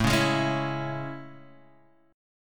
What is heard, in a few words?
A Major 7th A